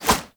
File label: Punch Whoosh; Warframe; Infested; Kick Punch